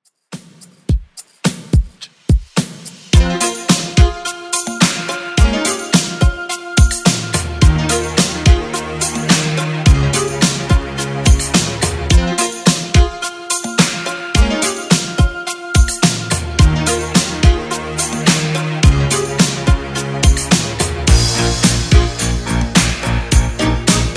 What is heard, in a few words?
80s Redrum 107bpm